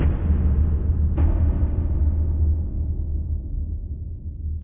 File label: Tymp